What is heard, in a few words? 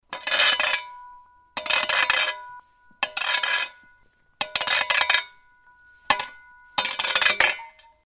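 lopata, shovel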